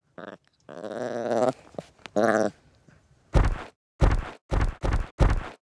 Mammoth Idle; Seer; GamigoNA

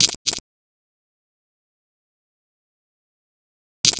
Item Click; Seer; GamigoNA

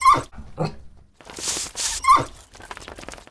dog sled stand

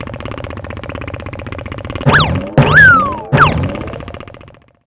Wedding Car Action

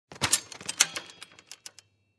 Tandem Bike Idle; Seer; GamigoNA

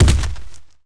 ape walk; Seer; GamigoNA

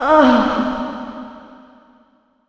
Water Baridon die

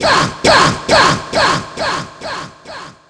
die

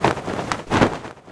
Harpy Thunder Run; Seer; GamigoNA

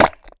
Slime Lump Regen; Seer; GamigoNA

